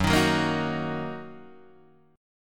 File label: Fsharp 6th down Guitar Open D